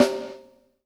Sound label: HIT VERB, PROC SNRS, Drumworks